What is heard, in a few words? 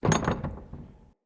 Guarded Chest Locked A; Encounters; Mini Boss